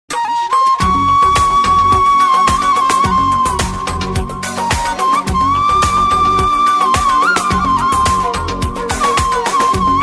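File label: Nice Flute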